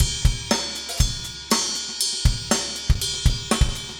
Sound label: funk, 120bpm, loops